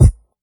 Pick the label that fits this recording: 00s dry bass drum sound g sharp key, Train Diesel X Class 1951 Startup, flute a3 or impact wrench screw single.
00s dry bass drum sound g sharp key